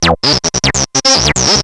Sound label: ring